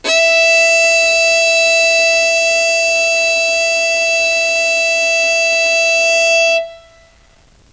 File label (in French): violon mi